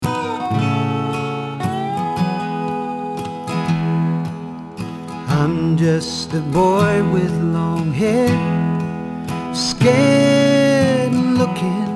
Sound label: live vocal